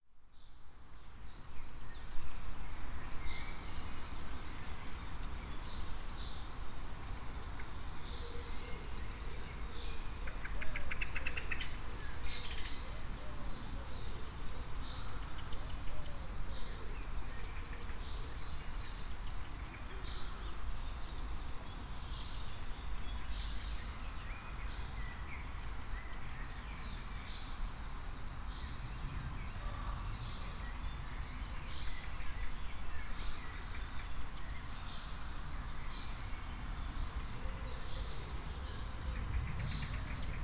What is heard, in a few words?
ambience